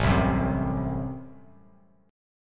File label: A Minor 6th A, Chord; Am6 strummed; JGuitar